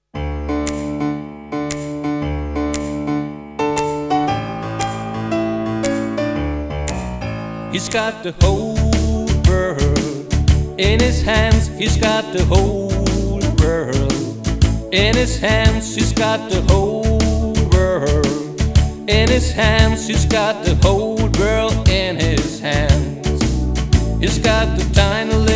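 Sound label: Hes Got The Whole World, ukulele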